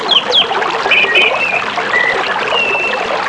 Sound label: Birds in a river sound